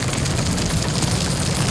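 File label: droideka Roll loop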